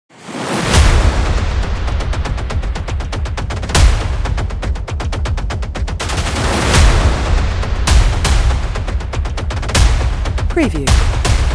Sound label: Action Thrust